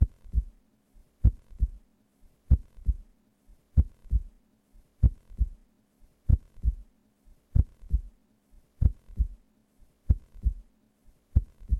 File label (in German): herzschlag puls langsam; salamisound